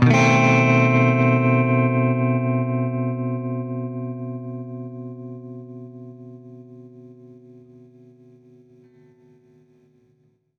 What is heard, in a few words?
Baritone Chord C7b5, Guitar Chords; undercover samples